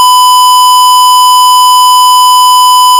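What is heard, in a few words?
Square 1000Hz; NCH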